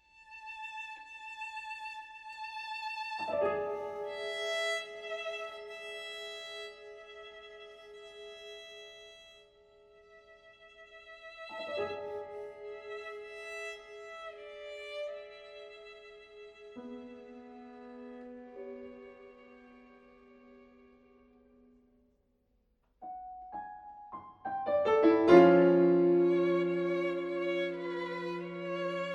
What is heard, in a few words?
Piano Quartet, Tranquillo